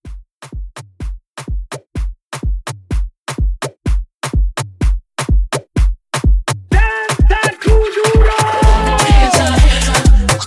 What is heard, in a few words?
Hype Rework